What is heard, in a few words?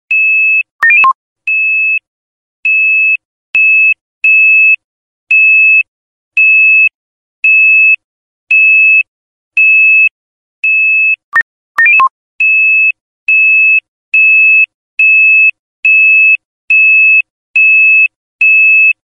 iphone 6 new alarm ringtone